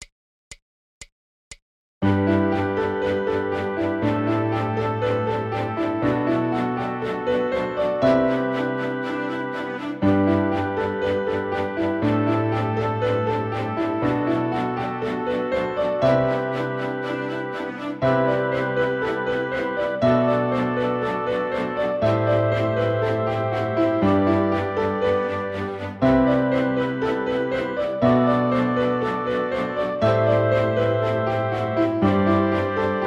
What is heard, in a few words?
Funk